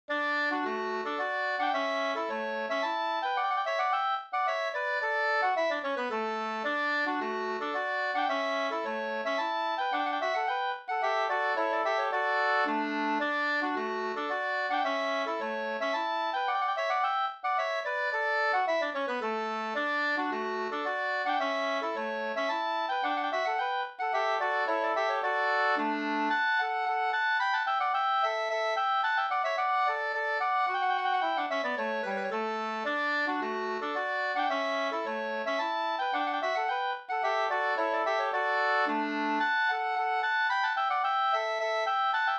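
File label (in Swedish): slangpolska, two-part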